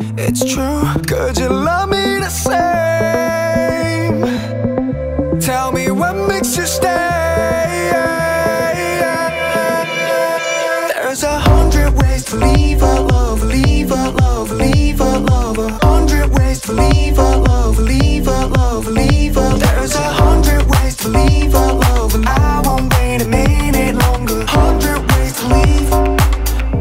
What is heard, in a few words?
English Song Ringtone